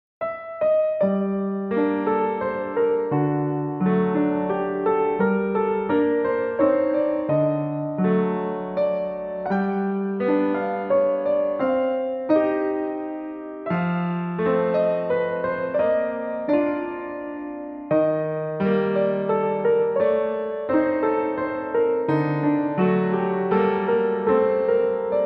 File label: Metal Sad Song